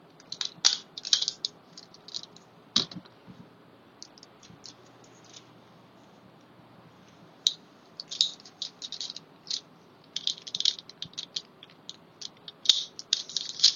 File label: Staple Remover